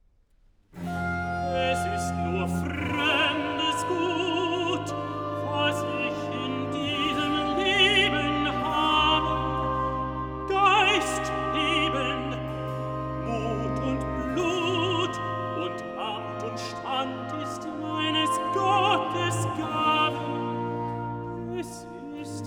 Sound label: Recitative (tenor); Johann Sebastian Bach; BWV 168; Es ist nur fremdes Gut; Cantatas for the 9th Sunday after trinity